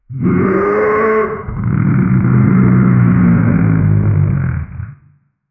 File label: a kaiju monster growls an